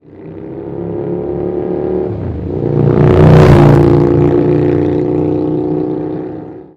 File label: FLYBY